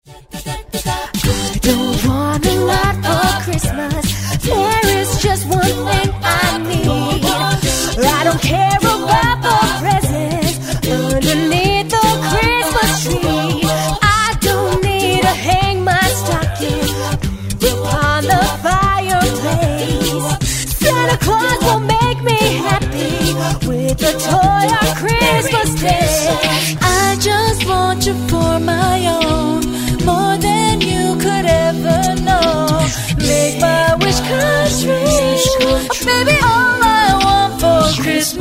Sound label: acapella